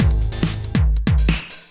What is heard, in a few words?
TECHNO BEATS 140